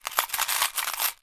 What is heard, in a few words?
foley coins money movement in wooden container